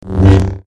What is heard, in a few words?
saber hup